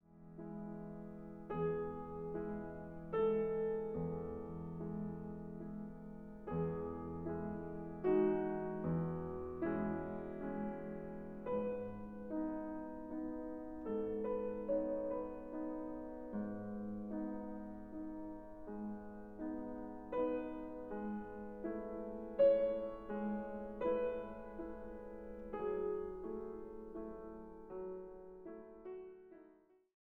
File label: Ravel Piano Concerto in G